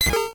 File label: Bomushikaa Cry; Pokémon GS SW